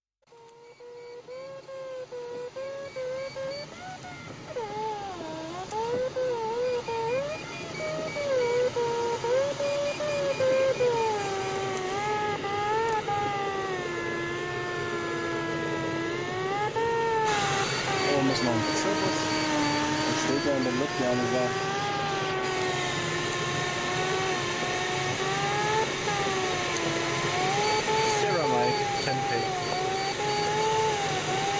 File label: musik